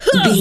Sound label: voice female attack